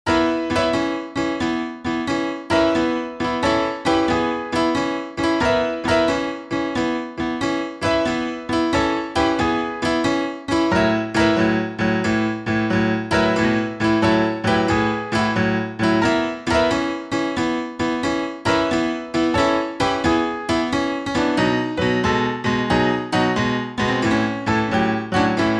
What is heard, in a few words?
Walking Bass 12 Bar Blues